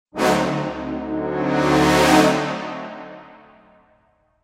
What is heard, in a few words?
Brass Ensemble Sforzando